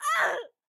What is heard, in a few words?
town human road hit; Blind Drive